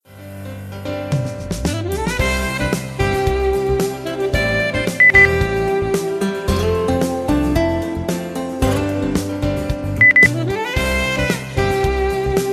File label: pop happy song